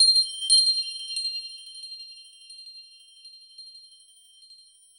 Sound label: clean iconic rington